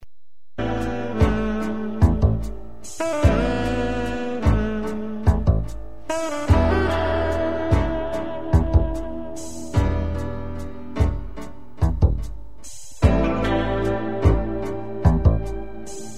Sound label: Instrumental; Dolphin